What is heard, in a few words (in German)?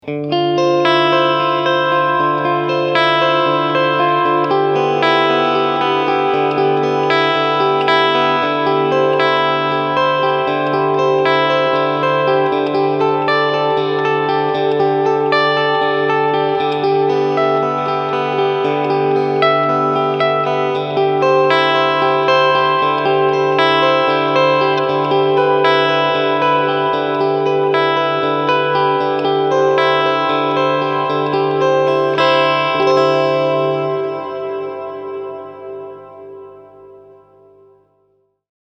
Hall Variation